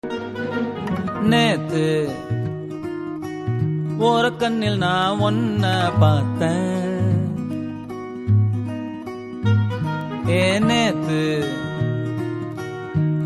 Tamil Movie Ringtone; Jagame Thandhiram

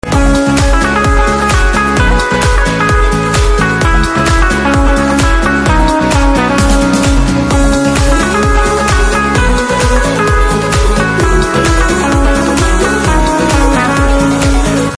Instrumental Tone; Race 3

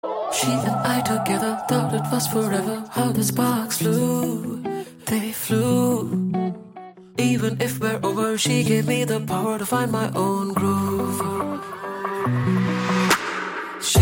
English Version

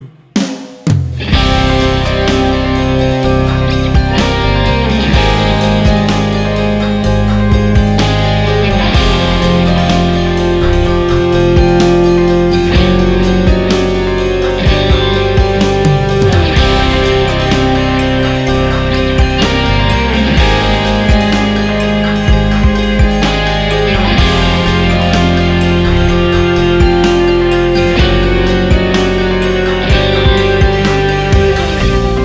Electric Guitar Tone

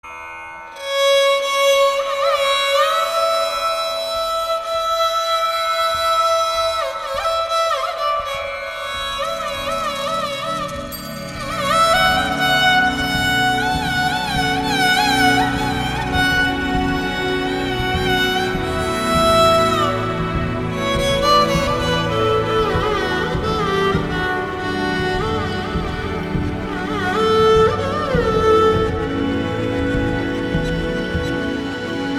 violin music solo

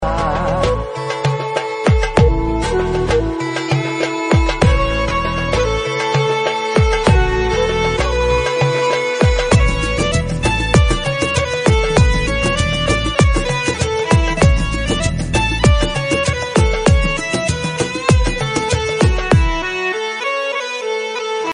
BGM Flute Ringtone

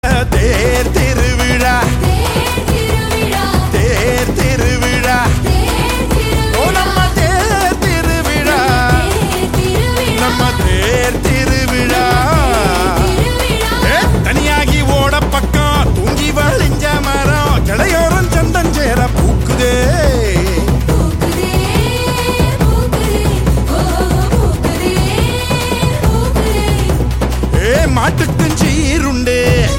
Ringtone

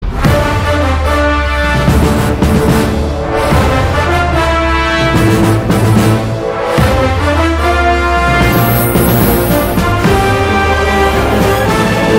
om bhur bhuva swaha ringtone

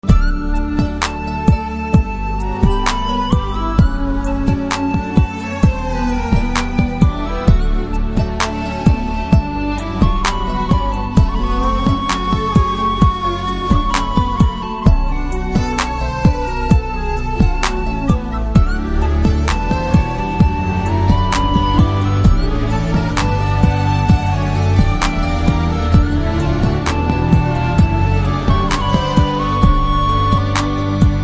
BG Flute Ringtone

